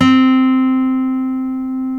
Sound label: STEEL C, FOLK STEEL Guitars; Spectrasonics; Hans Zimmer Guitars VOL-1